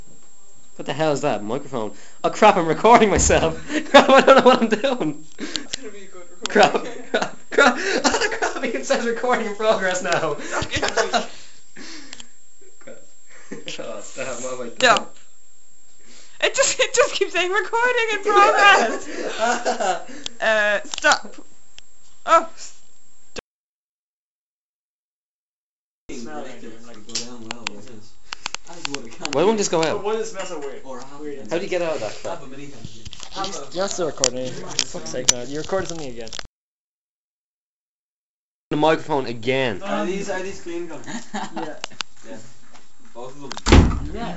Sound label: garage recordings